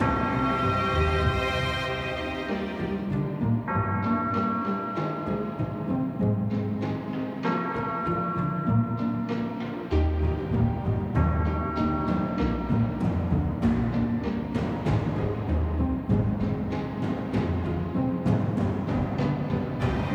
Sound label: loop; Recode; jewel Quest Riches